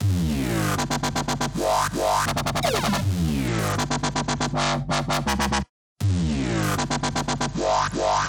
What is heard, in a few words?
wobble